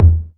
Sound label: drum